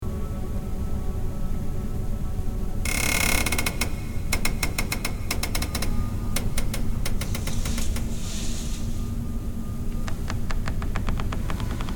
music Hotel Room; Essex

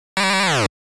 Snow-Bee Stunned; Pengo; arcade

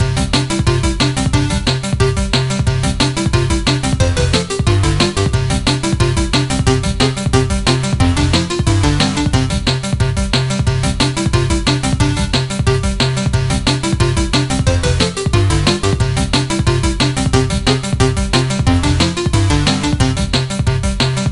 BGM Mini Game

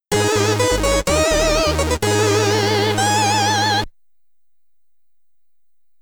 Get Ready! (SID Stereo); Tales of Boon; Commodore 64

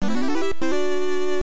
Start, Power-Up SE; TwinBee 3: Poko Poko Daimaou; Family Computer

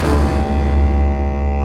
Mus Tsunami 145 Light; From Dust; PS3; Xbox 360

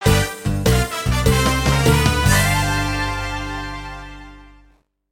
Defeat; MLB Power Pros

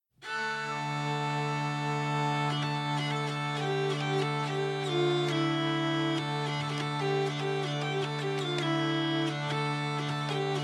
Tavern; Assassin's Creed Unity

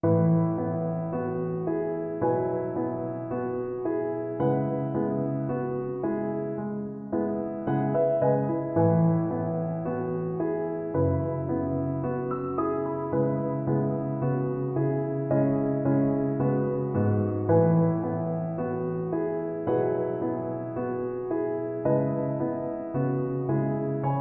Piano Solo; Ikenfell Original Game Soundtrack 2020

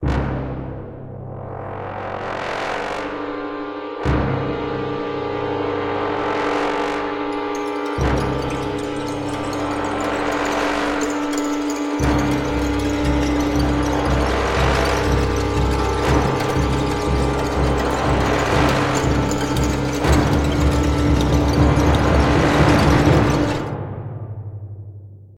Intro Glitch; There Is No Game; Jam Edition 2015

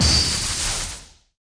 Electric bullet; The Denpa Men; Android

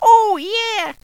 Selected Squeak

